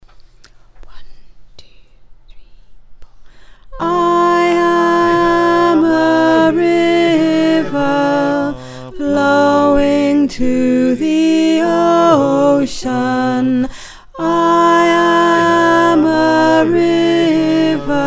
Alto

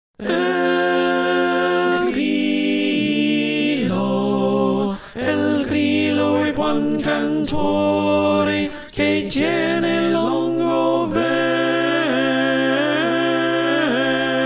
Slow Alto

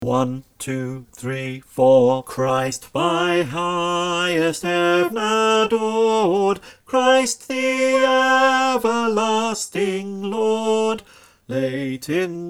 unison, choir

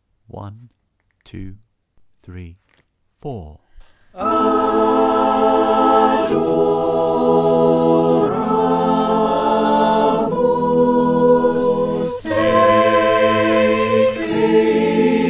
SATB Mix

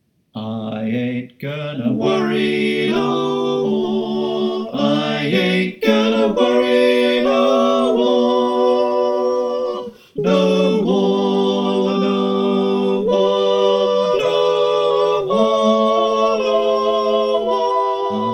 SATB

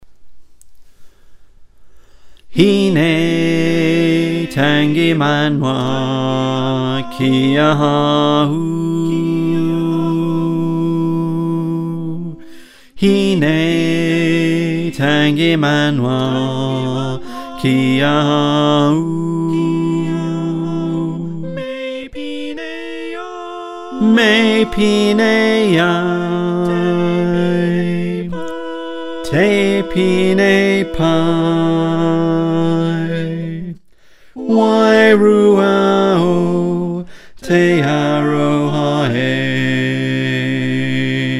Bass

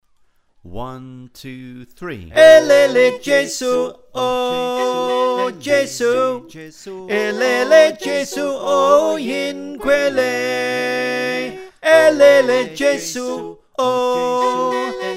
Tenor, choir

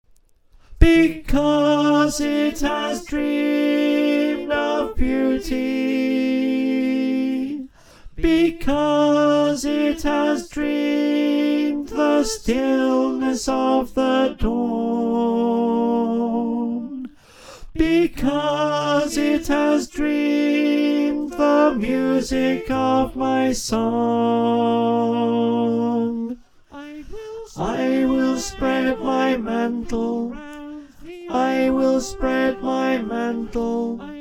up a tone in F - Ten

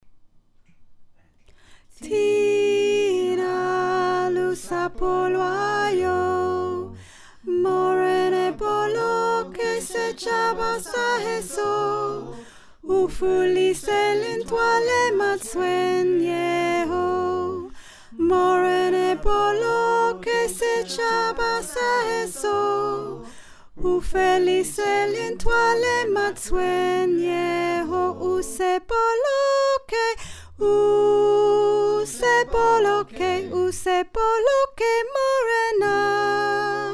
Soprano, choir

